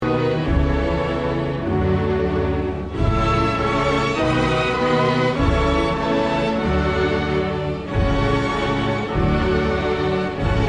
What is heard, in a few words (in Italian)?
filarmonica; v gergiev; filarmonica della scala